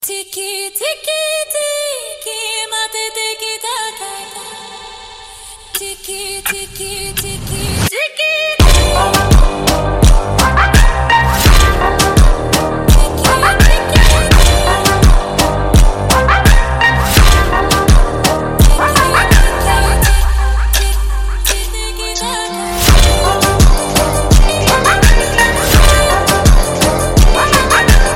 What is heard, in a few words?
Phonk Music; فانک phonk